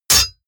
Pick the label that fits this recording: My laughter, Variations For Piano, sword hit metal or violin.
sword hit metal